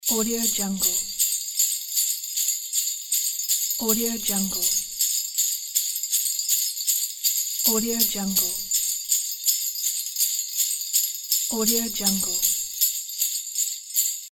christmas sleigh bells loop

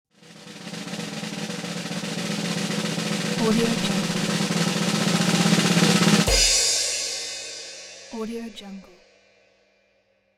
drum roll winner announcement

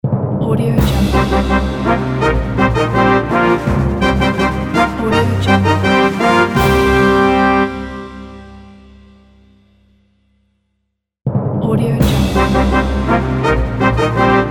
ancient fanfares logo

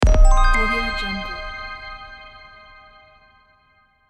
luxury sound effect

